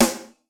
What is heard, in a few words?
Snare, Yamaha RY20